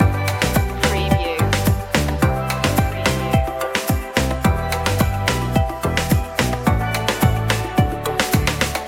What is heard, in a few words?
tropical fun loop